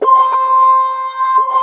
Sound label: Crier; Mo' Phatt; Digital Sound Factory Mo' Phatt